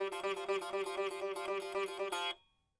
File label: sitar